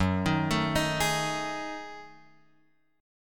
F sharp Minor 6th Add 9th, Guitar; F#m6add9 Chord {2 X 1 2 4 4} arpeggiated

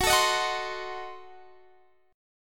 F sharp Diminished 7th F sharp Guitar Standard; F#dim7; x,21,19,20,19,20; strummed; capo=2

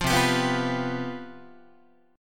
C Major 7th Suspended 4th, C, down, Guitar

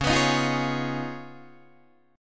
Db 9th, Guitar, Open D

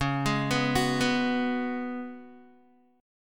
C sharp 7th Suspended 4th C sharp, Guitar; arpeggiated

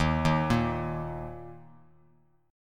E flat 5th E flat, down, Bass Standard; arpeggiated

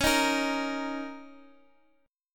Bb Minor Double Flat 5th Db

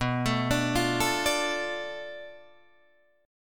B Minor 7th Flat 5th B Guitar Drop D

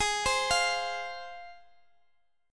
G sharp 6th G sharp down Guitar Open D, chord

